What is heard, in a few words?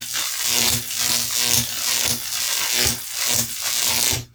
Shock; mekanism